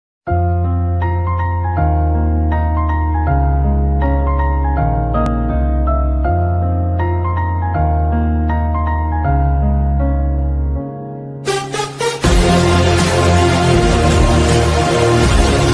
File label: motivational ringtone; kalki